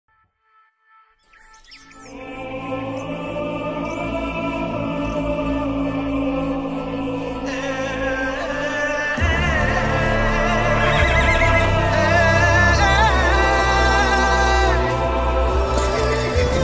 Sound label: song bgm; kalki; prabhas